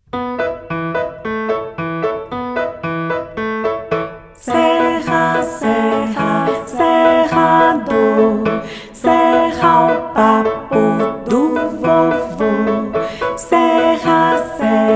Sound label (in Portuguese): Serra, Serra, Serrador (Vocal); Violino para os Pequeninos